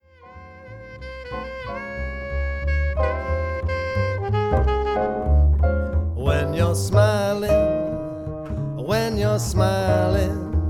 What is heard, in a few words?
piano trio